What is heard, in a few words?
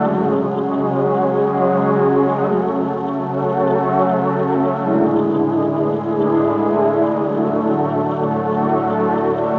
chords; ゆめ2っき